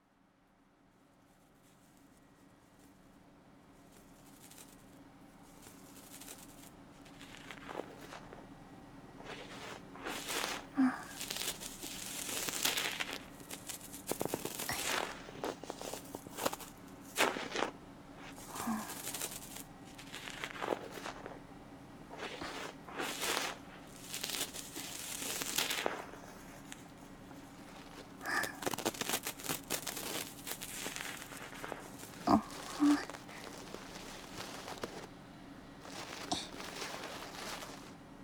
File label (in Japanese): 竹耳かき・左耳, ASMR; 竹耳かきでスッキリ